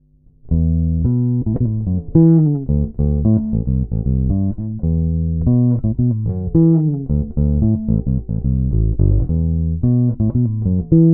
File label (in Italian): JBA54 VS 60s Manico dita chiuso